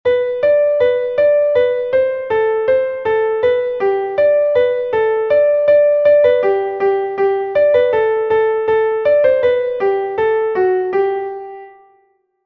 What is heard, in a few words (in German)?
klavier; die vogelhochzeit ein vogel wollte hochzeit machen; melodiemeister; Kinderlied „Die Vogelhochzeit“